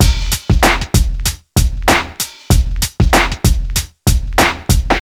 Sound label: 96 bpm drum groove f key, kick tuned to the F note